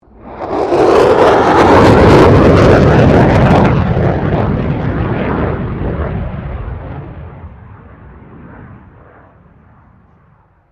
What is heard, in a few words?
Airoplane Jetfighter F16 Fighting Falcon Looping, Airshow; Avalon Airshow 2009; Airplanes